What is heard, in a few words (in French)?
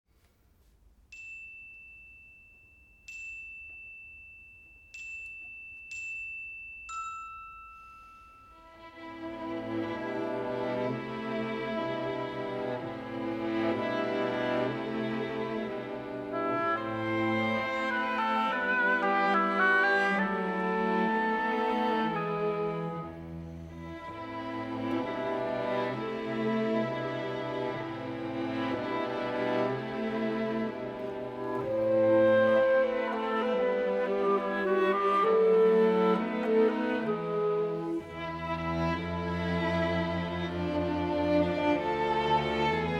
Orch seul; un petit prince